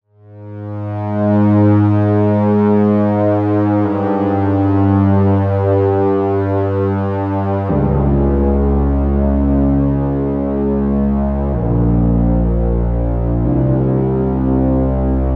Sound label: Fm Synth String Bass, 125